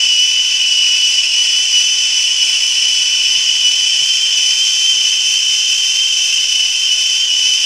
whistle, Tea Party; SFX

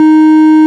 ORG, Farfisa Clarinet

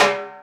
PERC, Dance Mega Drums; Best Service; PERC-MIX

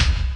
CRAK BD, Industrial; BD KIT; Industrial Loops

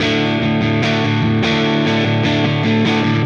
Silos Dirty Strum Guitar, Indie Rock

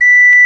OCAR B; Roland - LA Composer vol. 1

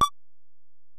Bell, Alesis DM-PRO

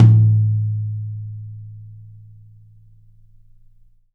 YAM 9X13, Drums; Big Fish Audio; Ross Garfield Drums VOL-2

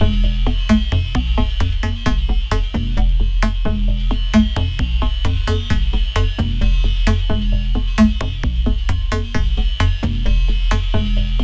Sine Wave, Processed Percussion

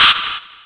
Verb, Hit; Sonic Foundry (Sony Creative Software) - Future Retro Dance Excursions

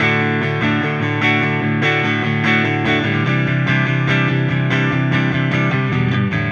Silos Clean Strum Guitar, Indie Rock; Sonic Foundry (Sony Creative Software); Indie Rock Anthems; Session 11 - Silos

